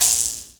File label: MOUTH BOW, Heart of Africa